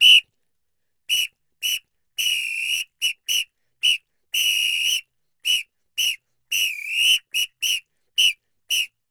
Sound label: Pito Murga 110 bpm; Best Service; Festa Latina VOL-1